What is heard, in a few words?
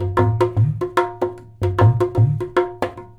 DHOL; USB Soundscan vol.45 - Indian Traditions; AKAI